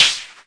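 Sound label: mbrute snare, Arturia MiniBrute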